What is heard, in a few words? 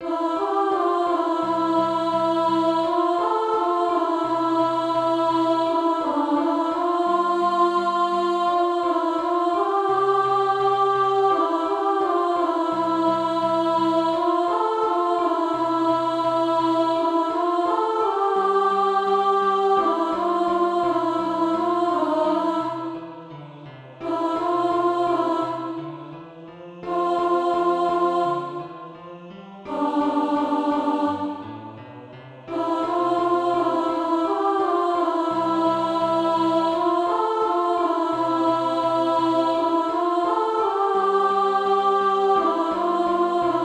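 alto, Practice Music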